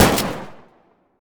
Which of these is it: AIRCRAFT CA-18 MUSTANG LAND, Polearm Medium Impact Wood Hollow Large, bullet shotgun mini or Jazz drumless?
bullet shotgun mini